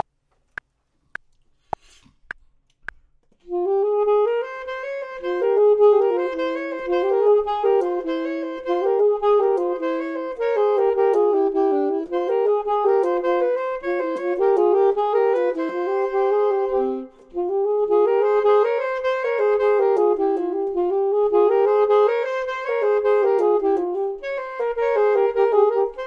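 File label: both, Saxophone and Clarinet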